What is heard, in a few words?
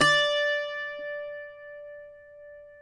cuatro C; Spectrasonics; Hans Zimmer Guitars VOL-2